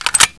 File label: Sten clip in, weapons; dod